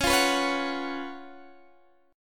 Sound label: Db 11th Db, down, Guitar Standard; strummed; JGuitar